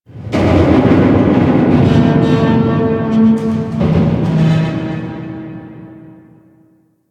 Metal Groans; Warframe; Ambience; Entrati

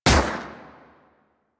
Shot, Akimbo Pistol; Grineer; Prisma Twin Gremlins

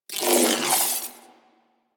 Ball Held Spark; Missions; Tenno Ball

